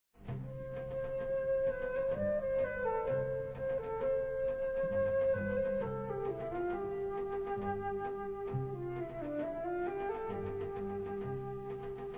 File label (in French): Theme A joue a la flute; Bolero Ravel